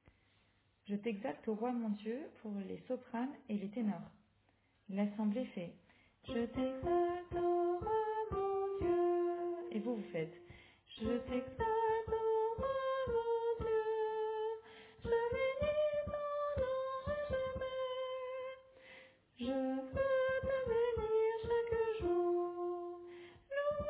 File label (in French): Soprane Tenor, chorale